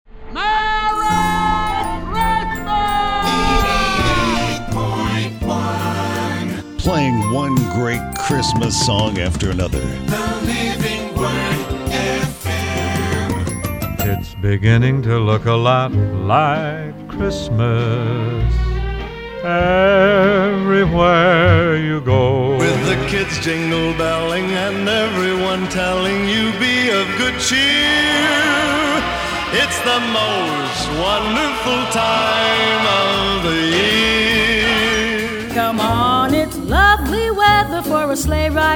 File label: Christmas Music